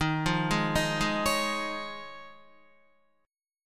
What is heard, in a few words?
Eb 7th Suspended 2nd Suspended 4th Eb, Guitar; arpeggiated